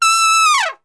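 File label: E 4 TRP SHF, Trumpet; Best Service; Brass Super Section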